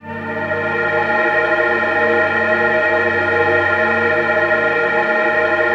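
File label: ATM HORROR, Production Effects; Best Service - XXL Production Effects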